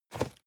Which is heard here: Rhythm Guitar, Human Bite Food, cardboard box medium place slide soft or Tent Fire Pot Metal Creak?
cardboard box medium place slide soft